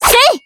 slash

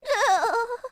dizzy; bb-taokaka

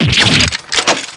damage; dgs2-drebber

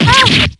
uhh; p4u; marie

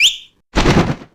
whistle; blackquill; taka

